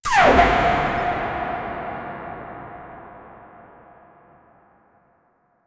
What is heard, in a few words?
bow shot, bow and arrow